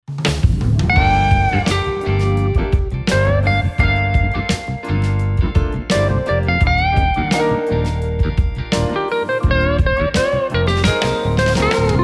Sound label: Total Guitar Bundle; Ilya Efimov Production